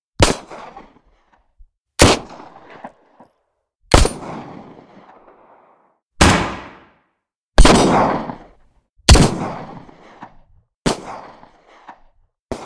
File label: guns construction kit sound fx; BOOM Library